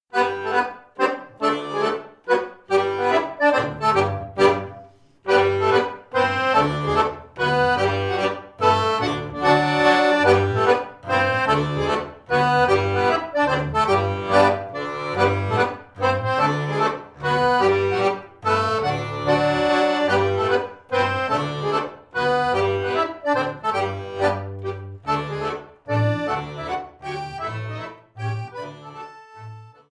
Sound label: Mazurka